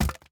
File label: wood hit